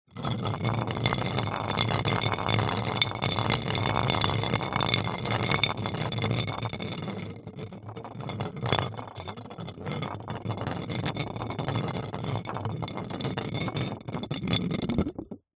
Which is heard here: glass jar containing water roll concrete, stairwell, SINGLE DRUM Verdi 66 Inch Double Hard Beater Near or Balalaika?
glass jar containing water roll concrete